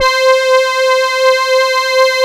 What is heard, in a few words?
Korg POLY800 C4; Back In Time Records; Korg Universe VOL-1